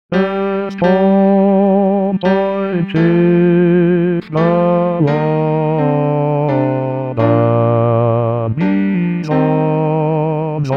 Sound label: bass